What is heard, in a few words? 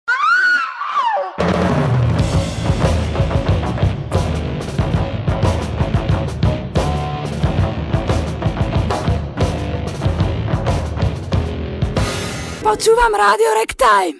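bubny, drums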